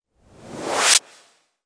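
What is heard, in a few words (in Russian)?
фантастический свист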